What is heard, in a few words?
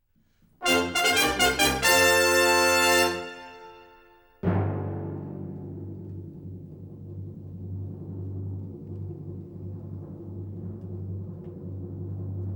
Brass Fanfare